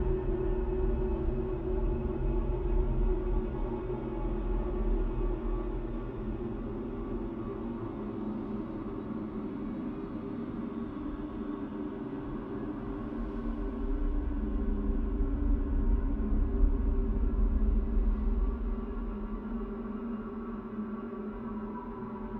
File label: Wind; LOOPERS